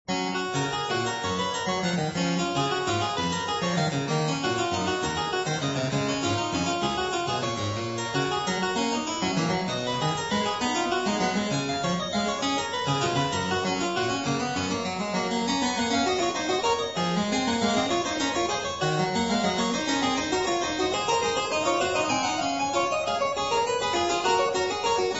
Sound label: Variatio 26 a 2 Clav.